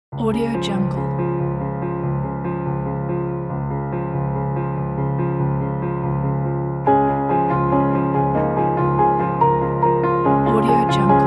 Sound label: hopeful cinematic piano